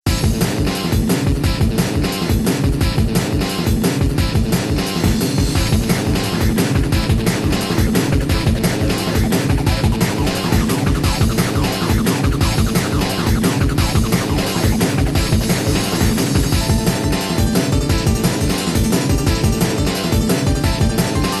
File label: Battle Sound; bomberman playstation psp